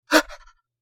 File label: Male vocalization surprised sigh gasp and inhale